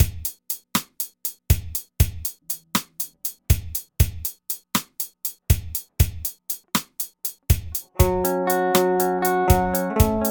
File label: guitar